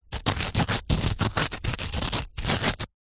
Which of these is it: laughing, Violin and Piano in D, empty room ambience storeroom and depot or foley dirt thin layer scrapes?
foley dirt thin layer scrapes